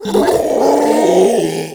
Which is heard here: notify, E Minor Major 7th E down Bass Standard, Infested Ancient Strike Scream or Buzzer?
Infested Ancient Strike Scream